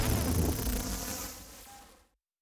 engine stop, sci-fi sounds, mechanical

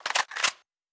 Weapon Reload

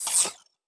Glitch, Electric

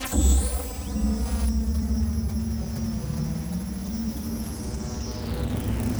engine start, sci-fi sounds, mechanical

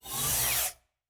Door, Sci-Fi Sounds

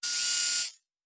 servo small, sci-fi sounds, mechanical

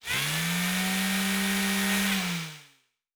servo big, sci-fi sounds, mechanical

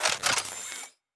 Weapon Reload (Laser); Sci-Fi Sounds

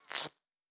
Spark, Electric

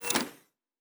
Door, Sci-Fi Sounds

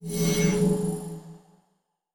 Teleport, Sci-Fi Sounds

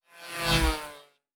Fly By, Sci-Fi Sounds Movement

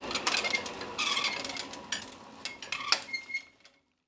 engine stop, sci-fi sounds, mechanical

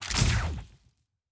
Weapon Shoot

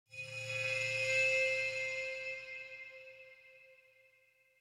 Suspense Transition, Horror Sci-Fi Music

